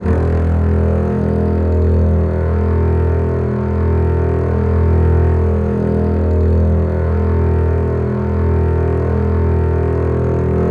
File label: Contrabasses, E0; Best Service ProSamples vol.51 - Classic Orchestra 2